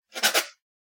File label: pen write; soundscrate